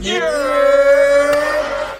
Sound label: yerrrrrrr